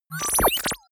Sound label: confirm bubble; maschinen; signale; soundpool